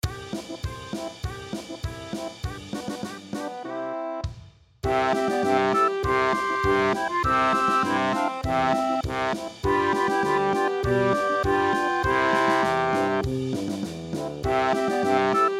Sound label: polka horn